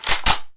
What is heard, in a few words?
saw close, weapons